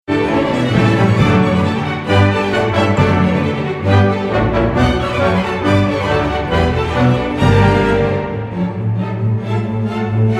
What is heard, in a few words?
Symphony Orchestra; Lancaster